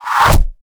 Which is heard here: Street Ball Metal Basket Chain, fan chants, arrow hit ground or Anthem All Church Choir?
arrow hit ground